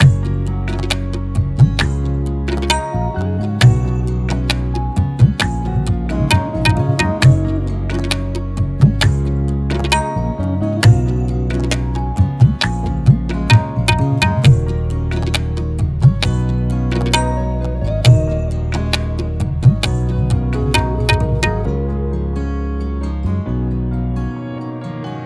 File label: tabla